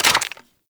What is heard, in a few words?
Infested Rifle Reload; Warframe; Quanta